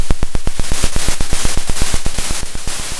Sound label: Sound of a drum roll